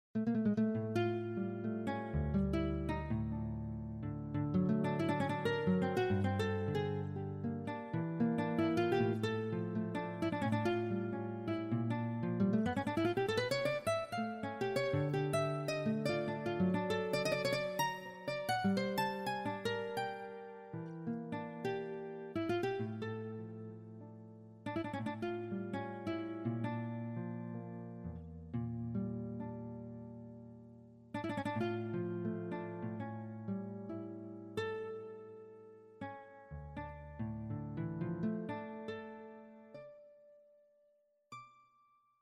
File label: guit